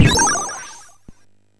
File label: SFX, MOS6581; Narco Police